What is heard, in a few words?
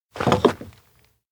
plate place watermelon; anemoi